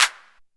CLAP, one shot drums; App Inventor Media

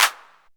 CLAP, one shot drums; App Inventor Media

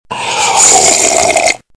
Slurp, Human

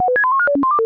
Computer Beeps

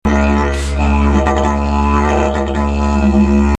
Dijjeridoo

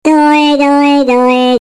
Doy doy doy, Vocals

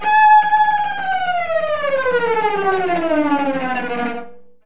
Spooky String

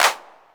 CLAP, one shot drums; App Inventor Media

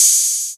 OPEN HAT, one shot drums; App Inventor Media

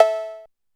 COWBELL, one shot drums; App Inventor Media

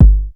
KICK, one shot drums; App Inventor Media

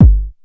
KICK, one shot drums; App Inventor Media

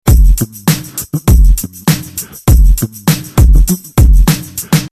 HipHop, Music Loops

